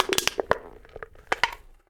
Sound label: can crush; 1000fx